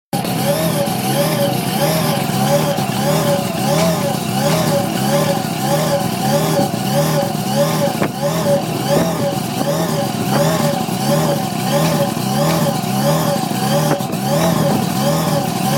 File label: supercharged rotary